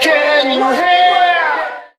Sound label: can you hear SUNG